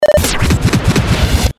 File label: Stabs & Bursts; Best Of N2O Library